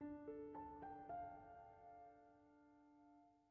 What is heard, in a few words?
Piano; Frozen Flame; windows